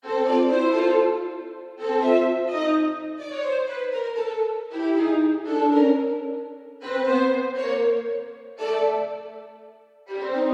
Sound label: violins; reverb